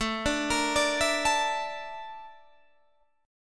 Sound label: A sharp Major 7th Flat 5th, chord